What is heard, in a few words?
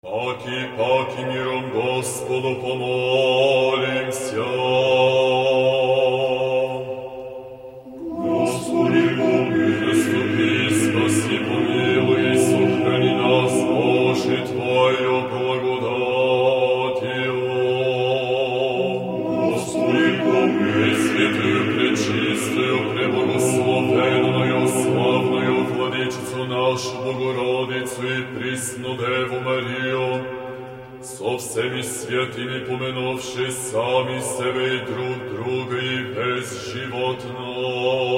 Bulgarian Liturgy, Malka ektenia (Little Litany); P. Chaikovsky